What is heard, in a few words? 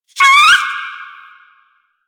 Rabbit Ambient Call; Duviri; Animals